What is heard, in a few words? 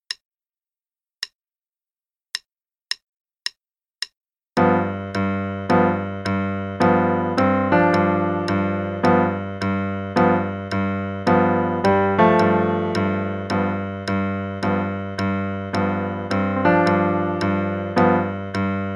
Dinosaur Dance Improv (qn=108); Level 1; Faber Improv